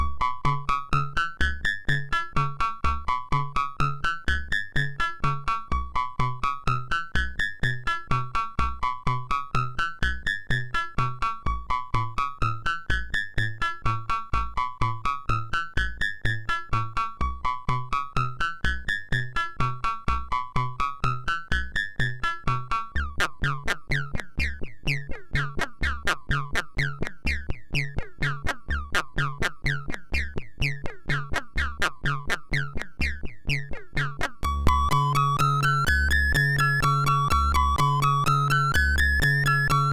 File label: Yamashikoe sid MOS6581; hvsc